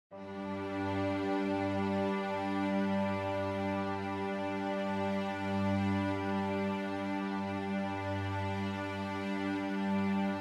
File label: celtic Version; ENGLISH